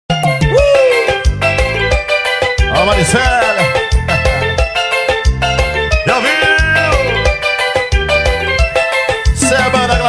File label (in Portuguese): promocional; hoje tem mel